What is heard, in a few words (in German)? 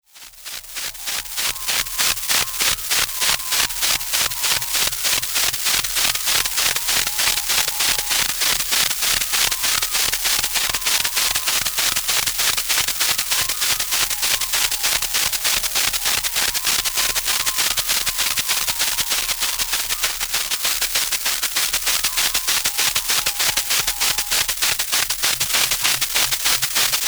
Experimentalaufnahmen Maultrommel